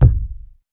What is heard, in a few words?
rich low end bass drum sample b key